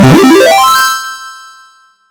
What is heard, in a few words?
victory; HikeMap; mapgamemusic